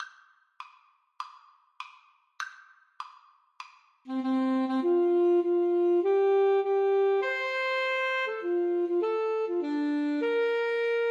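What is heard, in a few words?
Alto Saxophone Duet, karaoke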